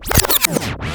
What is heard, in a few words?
backspin, Vinyl; Misc Percussion